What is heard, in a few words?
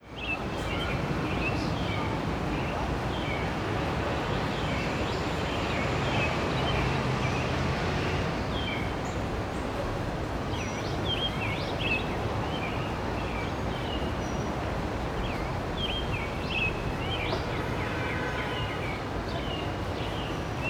Outdoor; Lasagrada